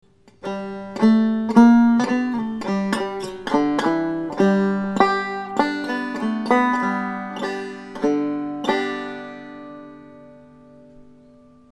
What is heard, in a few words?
slide